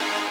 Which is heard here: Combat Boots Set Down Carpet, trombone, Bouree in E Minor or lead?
lead